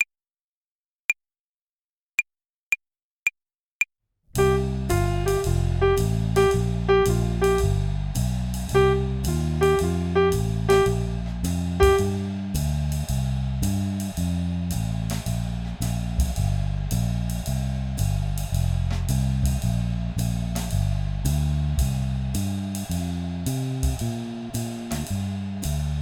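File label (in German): Swing Phrasierung Übung; play along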